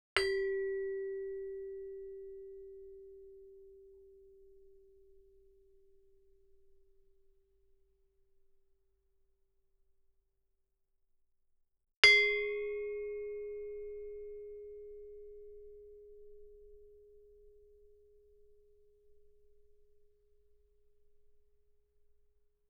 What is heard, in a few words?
Gamelan; Propeller Island - Complete Gamelan